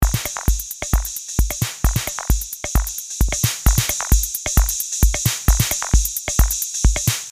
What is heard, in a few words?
drumbeat; SoundmaxxII